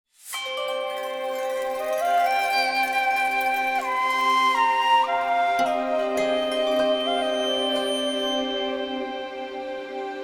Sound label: Menu Music; Fossil Echo